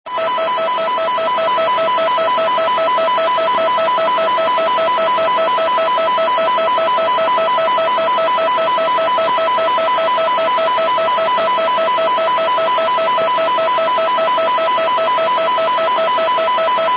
spark 7 6 mhz am

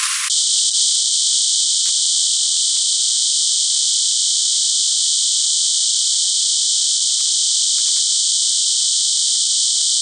ofdm-160 qpsk

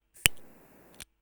zippo

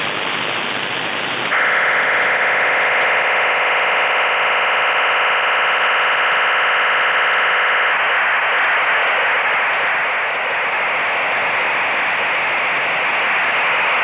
usb bpsk 2400; 109905khz

